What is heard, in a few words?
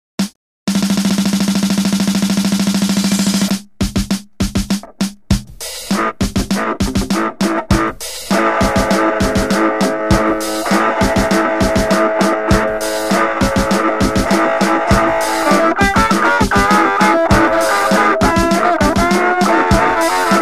marsh of guitars